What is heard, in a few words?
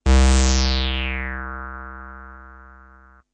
very reso, Synth